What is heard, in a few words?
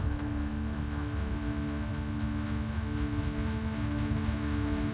riser sweep; sound effects